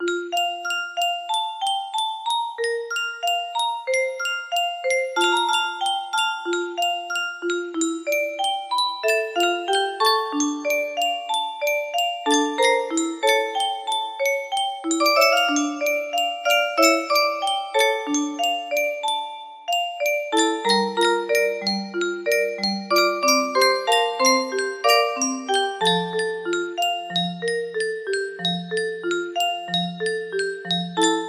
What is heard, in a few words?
in F, music box melody